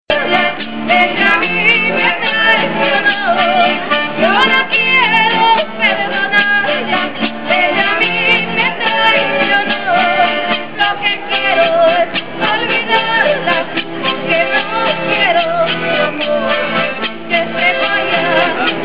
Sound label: Ecuadorian music